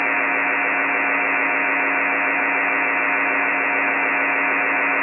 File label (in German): Stoerung 80m